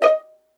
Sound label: D#5 PIZZ, Violin; Q Up Arts; Denny Jaeger Master Violin Library; PERFORMANCE